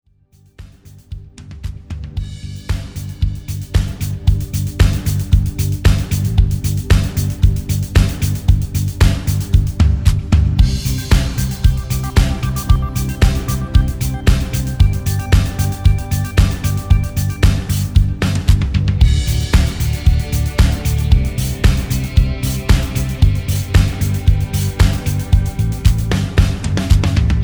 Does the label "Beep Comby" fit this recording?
No